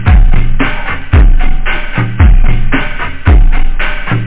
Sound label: drums; phat trax samples; drumloops